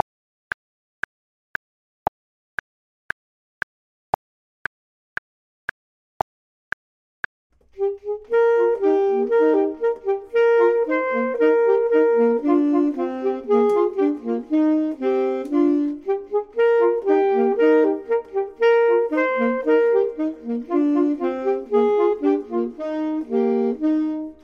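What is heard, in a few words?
both, Saxophone and Clarinet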